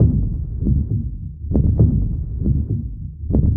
Music Locked Menu; sayonara wild hearts 2019 gamerip

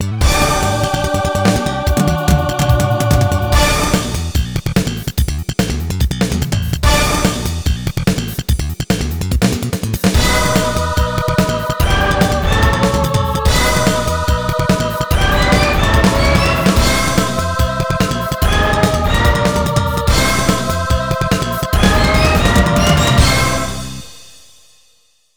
Press Start; Aero Fighters Assault; Sonic Wings Assault

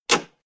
Click; Demon Cam

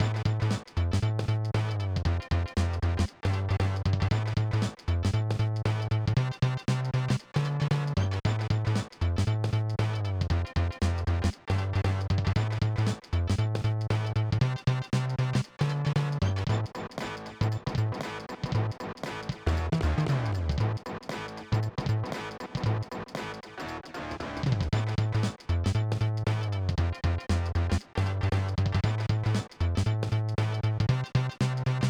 Lose Match; Slam Dunk Yonkyo Taiketsu; SNES

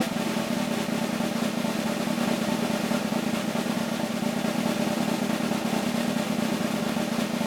DrumRoll; aster tatariqus android ios mobile gamerip 2023

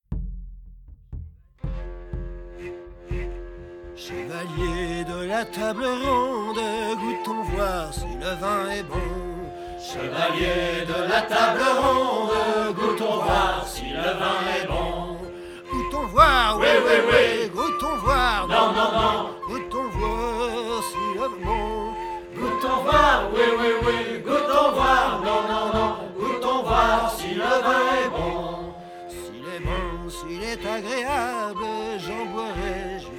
Tavern; Assassin's Creed Unity

